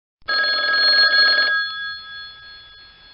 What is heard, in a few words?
telephone